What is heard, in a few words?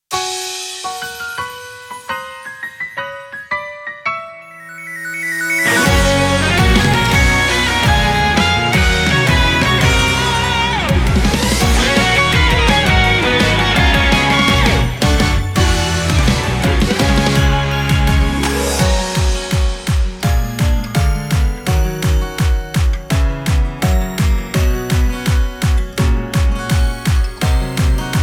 inst